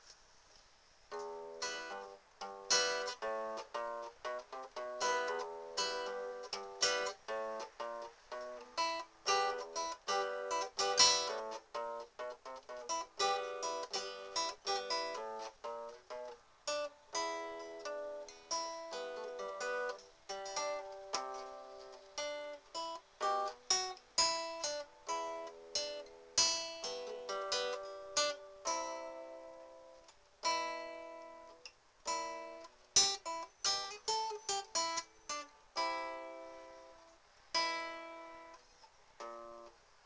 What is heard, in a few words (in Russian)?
guitar, Играем на гитаре